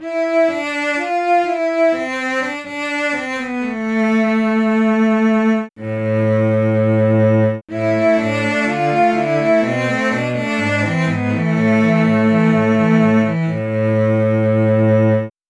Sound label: cello